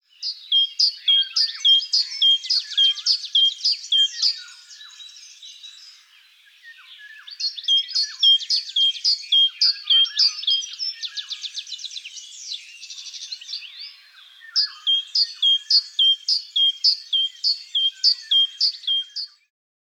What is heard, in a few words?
Parus major